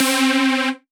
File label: Synth, DnB One Shots